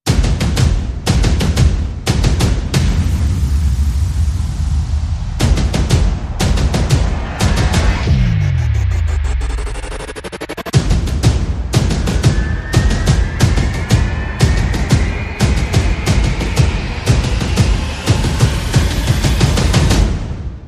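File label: Percussion and FX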